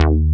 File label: PRO1